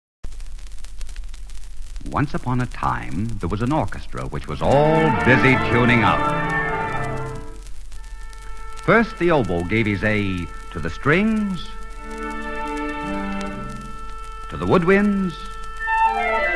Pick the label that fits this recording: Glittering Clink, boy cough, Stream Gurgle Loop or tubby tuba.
tubby tuba